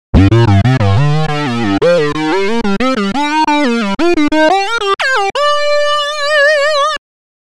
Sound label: sync solo deep; obx; vst audio; opxvst